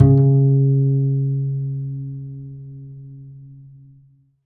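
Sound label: double bass C#2; Univers Sons; Jazzistic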